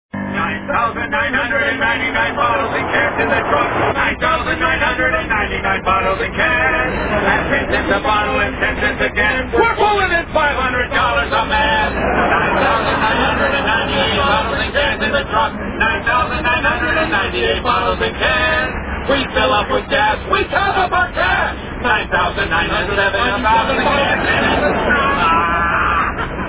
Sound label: bottle